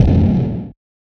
Fx

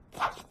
Guy Gets Back Up; State Alchemist

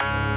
Synth

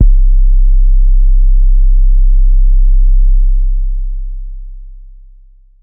metro frame, 808s

